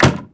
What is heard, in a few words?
Close; Laundry